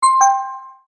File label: new Msg